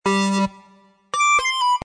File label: Tone Gen G, Techno & Dance; Loopology; Pumpin